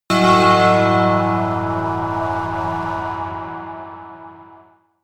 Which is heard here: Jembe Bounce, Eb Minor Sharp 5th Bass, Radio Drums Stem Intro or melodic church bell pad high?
melodic church bell pad high